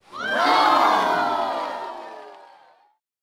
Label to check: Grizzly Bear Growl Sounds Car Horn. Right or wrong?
Wrong